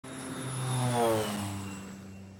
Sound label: plane crash; aviator